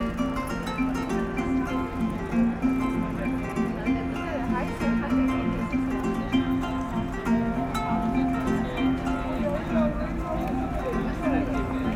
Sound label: african strings and voice in rome